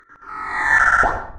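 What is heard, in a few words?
Sci fi Gun Power Charge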